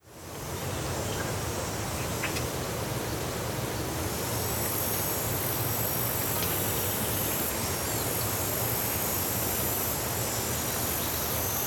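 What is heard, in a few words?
Mainz Farm ambience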